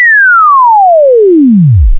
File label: Exp Decay